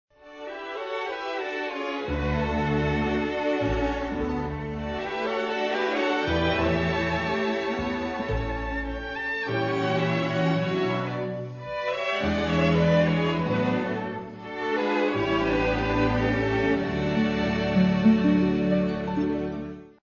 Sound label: viol; City Lights; blind flower girl